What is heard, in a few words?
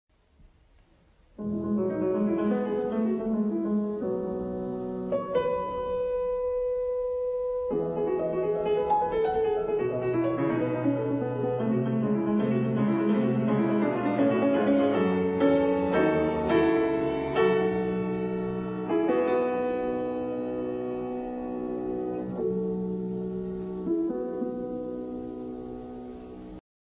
piano